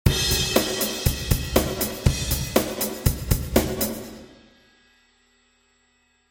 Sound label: echo fx